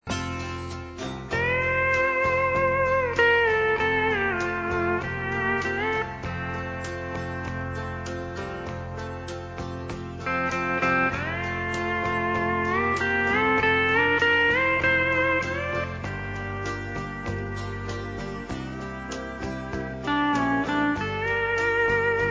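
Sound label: vibrato, steel guitar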